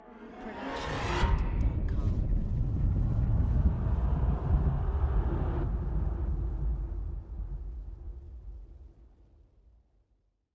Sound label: Hydraulic Lifter; soundscrate